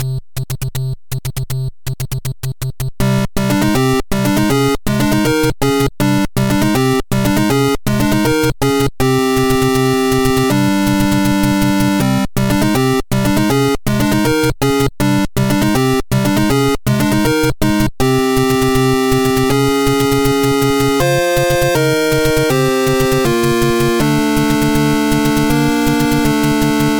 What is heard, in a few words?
Airship Hurry, BGM; Super Mario Bros. Remastered